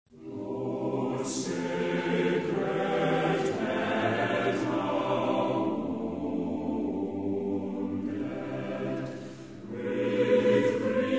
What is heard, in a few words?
Orchestra and Choir, Hymns